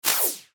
Hit Laser Fast Shot; Production Element Imaging Element Accent Transition; Lasers; Blastwave

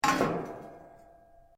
METAL ON METAL; Metal (Sound Effects:Materials)

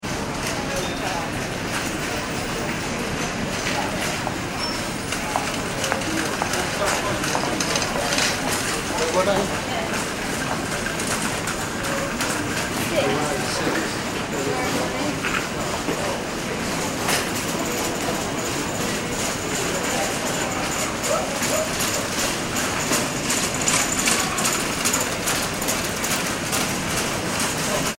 ambience airport heathrow baggage reclaim; Airport Ambience - Baggage Reclaim - London Heathrow Airport; Airports; Backgrounds; McKinney Sound

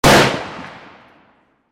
warfare gunshot rifle exterior; Rifles; McKinney Sound

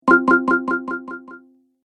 App Delay, ping; multimedia

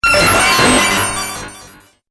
Droid Data Motion, robot; Robot Sound Effects

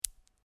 Marble Impact With One Marble; A marble or small pebble impact.; Miscellaneous Toy Sound Effects

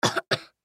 Human Cough; Cough Male Six Yrs Boy; Human Cough Male Six Year Old Boy; Sick; Blastwave

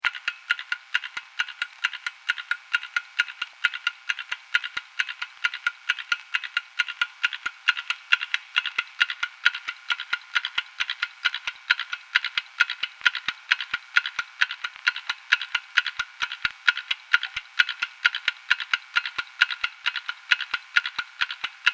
Clock Ticking Vintage, Grandfather Clock; Clock Sound Effects

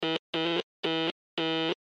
Morse Code; Morse Code J Letter; Technology; Blastwave

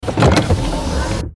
Sci Fi Robot Machine Servo Motors; Robots

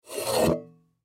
Bucket Small Metal, Bucket Metal Scrape; Materials; Blastwave; Foley

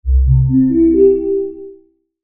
Logo Soft Synth Ascend; Sonic Logo; Blastwave

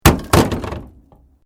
Metal Light Movement Minor; Metal (Sound Effects:Materials)

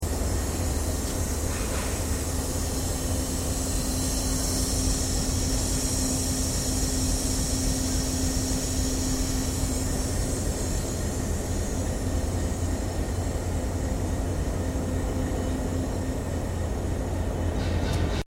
Train T Class Diesel Locomotive Idle Underside; Various Melbourne Trains; Vehicles; Vicrail

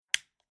Household Domestic Light Electric Switch Off; Light Sound Effects

